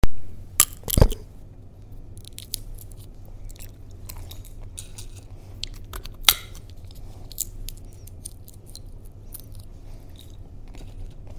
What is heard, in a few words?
Eating seeds